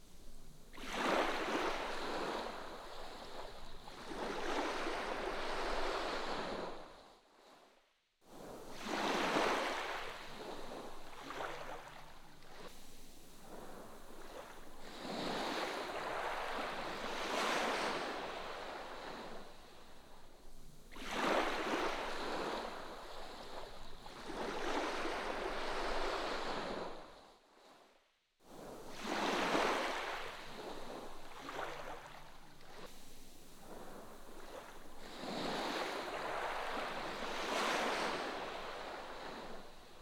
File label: Relaxing Sounds; Picross S2